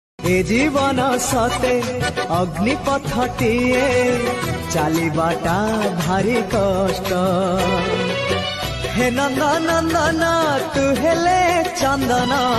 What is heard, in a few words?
odia bhajan; jagannath